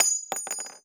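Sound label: bullet case bounce 50bmg wood hard; gmod; viper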